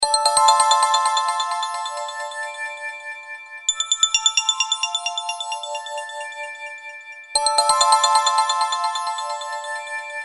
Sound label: DIAMOND RING ECHO, Ring Tone; HTC; E-Club Ring Tone Pack 1; E-Club Ring Tone Packs